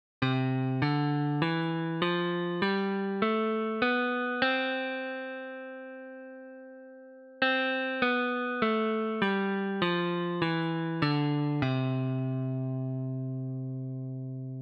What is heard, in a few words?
doremi, guitar